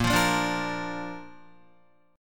A sharp Major, Guitar; strummed; JGuitar

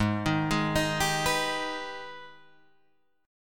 Ab Minor Major 7th, Guitar Drop D chord; AbmM7; arpeggiated

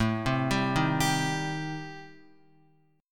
A-Minor 7th Flat 5th, Guitar, chord; arpeggiated; JGuitar

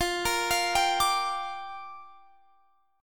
Bb 6th F

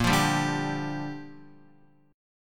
Bb 6th Bb, Guitar; Bb6 strummed

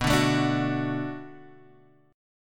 D Major B, guitar; D/B strummed

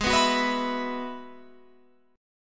Ab Minor 7th Sharp 5th, Guitar, Open F; strummed

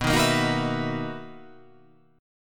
B Major 11th B down

